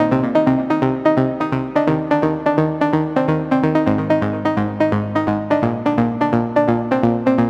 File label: Melodic Loop Cmin 128, Melodic Techno; Justify; Ghosthack; AC2024 - Day 12 - Melodic Techno